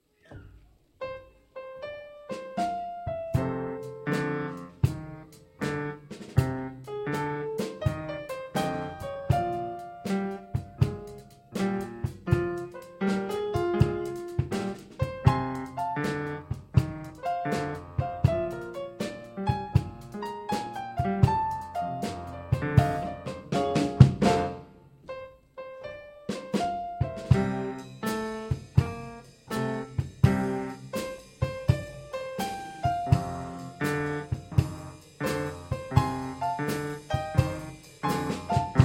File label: Birthday blues